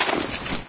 item pickup

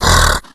hulk breathe; Left 4 Dead; Source Engine; tank

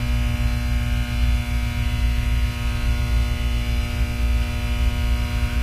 electric box hum; Source Engine; tf; lair

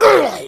hunter pain short

